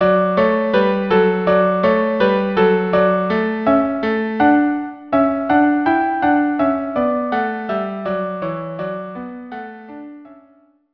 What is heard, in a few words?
Serenade violin cello; Serenade from don juan violin cello duo